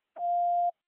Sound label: Police Radio Hold